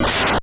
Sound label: Swoosh